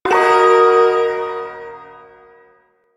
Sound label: Ersatz Shawzin Blues Chord; Music; Chords